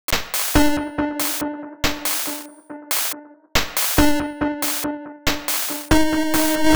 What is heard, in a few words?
140 FX D#, 140 BPM; loops; CHIPSHOP DELUXE